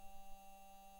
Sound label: mic, mars2020 edlcam